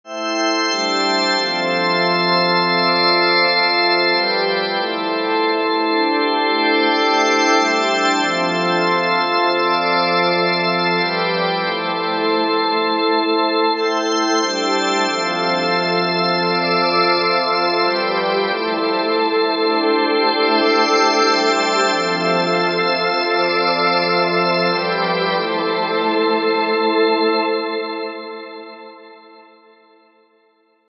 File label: organ; fire by music sound software